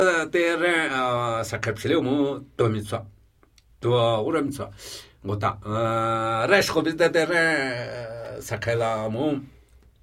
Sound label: Namuyi Tibetan Ritual Chanting